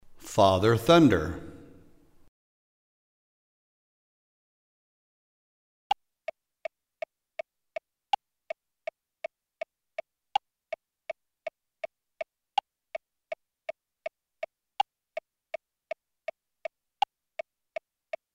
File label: Piano; Father Thunder; ACCOMPANIMENT TRACKS AND SOLOS AUDITION